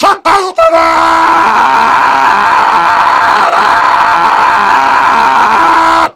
heavy falling; voice packs

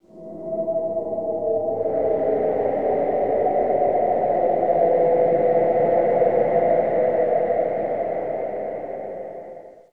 skinwalker flesh gait stinger; slender skinwalker

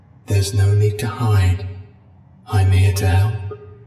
cheers; 049; scp sf

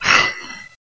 slower pain; cof; suicider

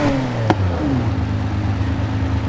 revdown; skyed; nfs heat ganimator pack

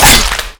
hit; slender; team killer

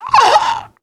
female zombie pain; nmrih; zerosiki; slender

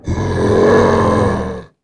alert, male zombie; nmrih; zerosiki; slender

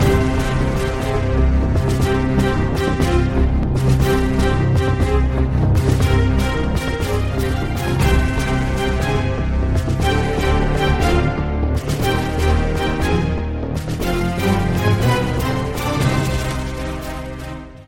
round mvp anthem; music; sudden attack; tfgo

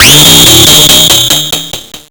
sting; driller; slender

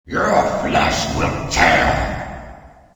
alert; slender; killercroc

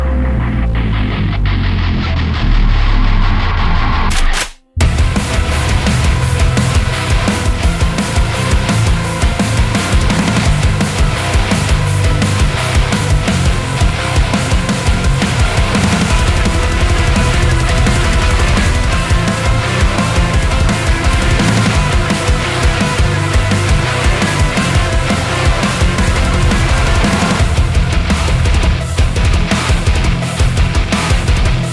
zombie hard, music; rpg fortress

